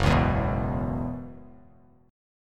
Gb 7th Suspended 4th, Gb7sus4 chord; strummed; JGuitar

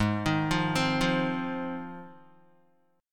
Ab 6th, Guitar; arpeggiated; JGuitar

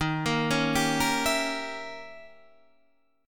Eb 7th Flat 9th, down; Eb7b9 arpeggiated; JGuitar

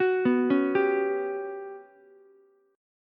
G Major 7th Flat 5th, Ukulele; GM7b5 arpeggiated

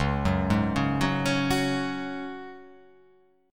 C sharp Suspended 4th C sharp down, chord; arpeggiated; JGuitar

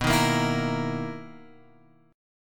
B Major 11th B, guitar; BM11 Chord {7 6 8 9 X 6} strummed

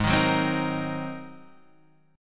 Ab 9th Ab down; Ab9 strummed

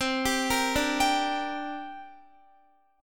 C 7th Suspended 2nd, Guitar; C7sus2 arpeggiated

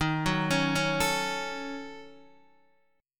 Eb Minor 7th Flat 5th, Guitar DADGAD

